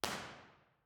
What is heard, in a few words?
central hall; central hall university york; b-format